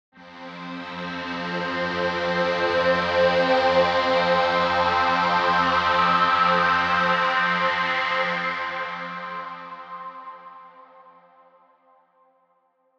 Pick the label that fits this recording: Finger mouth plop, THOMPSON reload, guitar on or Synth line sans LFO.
Synth line sans LFO